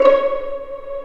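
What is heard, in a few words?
Vio pizzicato C#4; Digital Sound Factory Virtuoso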